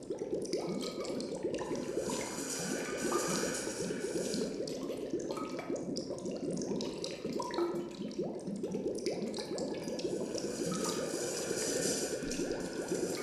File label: alchemist workshop loop; Hollow Knight: Silksong